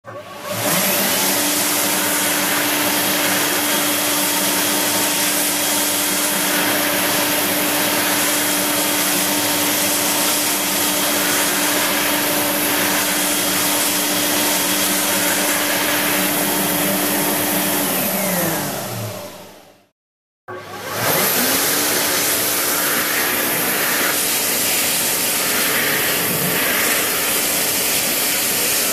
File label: Hand dryer; toilet